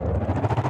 Spitter Rumble; Super Mombo Quest

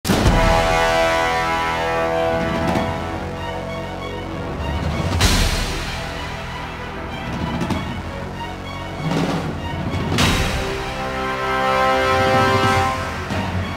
Electro Chase Theme; The Amazing Spider-Man 2; PS3, PS4, Wii U, Windows, Xbox 360, Xbox One

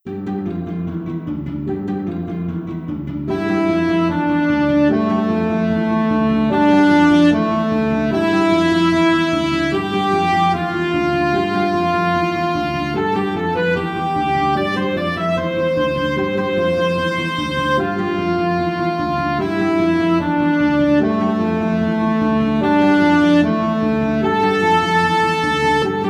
vigilant battle

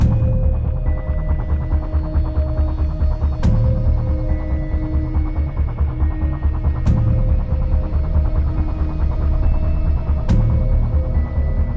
Monastery Fight Loop; Infinity Blade 3

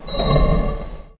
door; Shin Megami Tensei IV Apocalypse; 3DS

